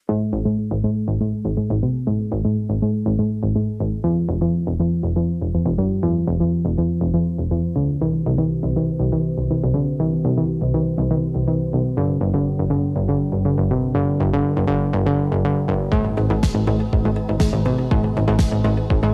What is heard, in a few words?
Electronic Impulse